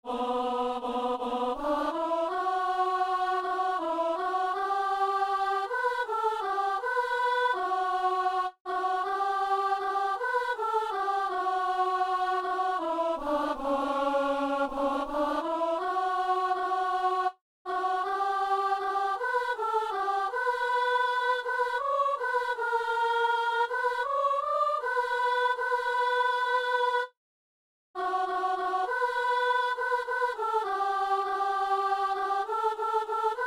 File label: soprano; Messe du 17 janvier à Saint-Denis